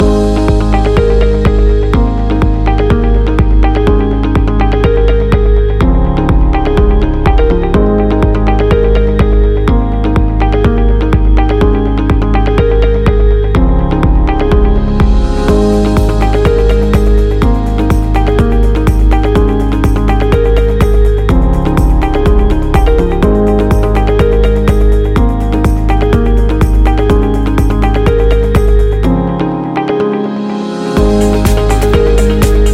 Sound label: Inspiring Corporate Loop